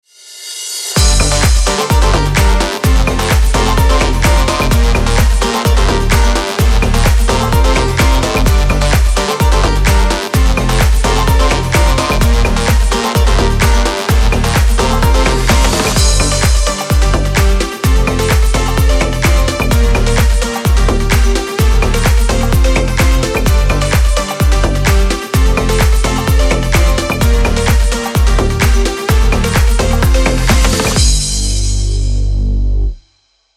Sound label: dancing on the waves background tropical house music for video